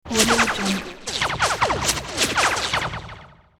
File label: laser zaps with reverberant warbles; hollywoodedge